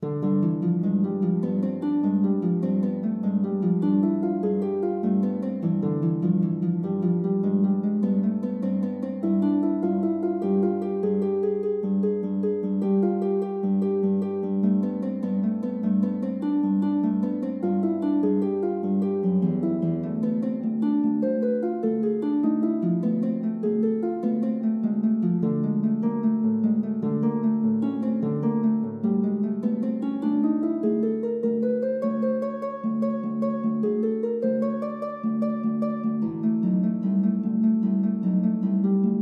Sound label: gigue, lute